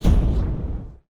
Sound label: spell fire take; player